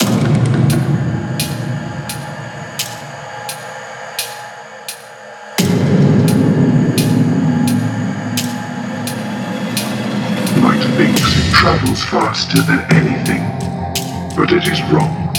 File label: Foley Drums; abstract foley recordings; BHK Samples